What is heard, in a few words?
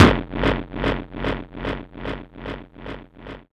HD BD, Hard & Loud Techno; USB Soundscan vol.01; AKAI